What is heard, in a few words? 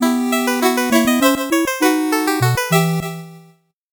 8bit jingle normal